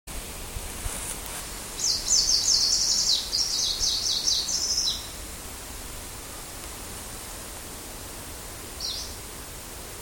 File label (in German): gruenlaubsaenger